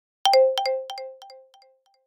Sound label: Alert notification delay